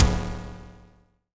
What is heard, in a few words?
Alien FM Perc Med; Ableton; Noise Garden - Augmented Percussion; Alien FM Perc Vol. 1